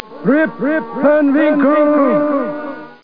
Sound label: rip shout; Greatest Stories